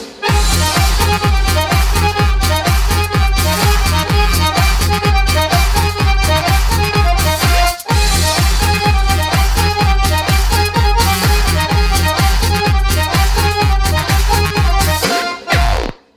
Balkan

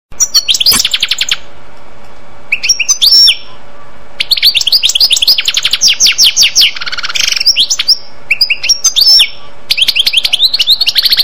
Bird

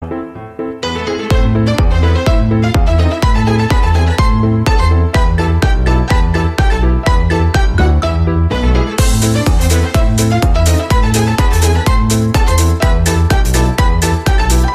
TURKISH MARCH EDM

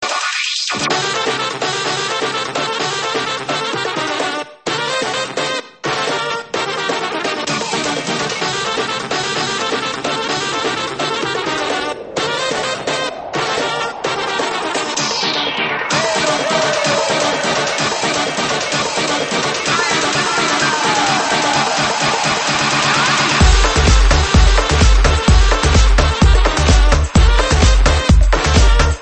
Gipsy Music

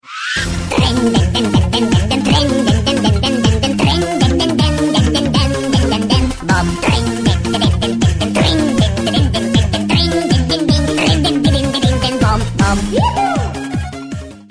Happy Jewish Ring

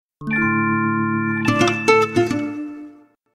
RATP Jingle

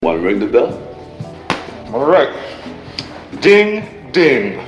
Ring The Bell

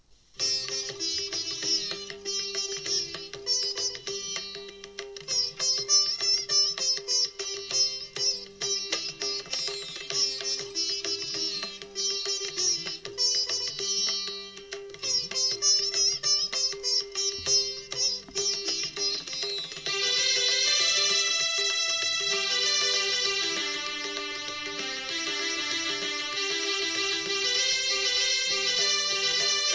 Morning Raga

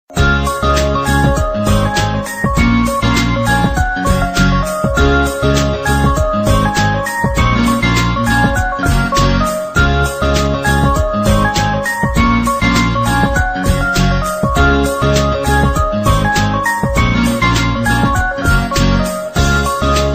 Hot Air Balloon

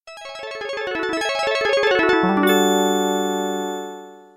8 Bit; Capcom

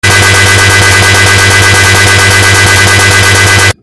Game Crash

